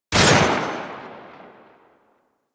Hand Shotgun Shot; Warframe; Hand Shotgun Prime

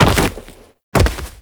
Downed Fall B, Foley; Enemies; Warframe; Teshin; DE

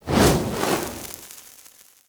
Torch Whoosh; Weapons; Enemy; Torches

